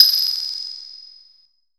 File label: SYN BEL; AKAI S-Series CD-ROM Sound Library VOL-5; FX SYNTH BEL